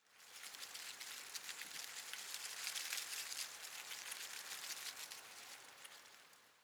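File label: shrub small leaves rustle soft, garden; haus-garten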